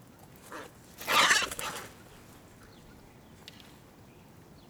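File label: hooded crow corvus cornix cornix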